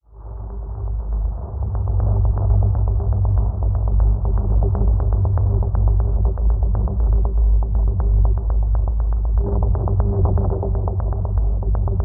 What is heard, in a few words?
Thunder Bass Pulse 120bpm G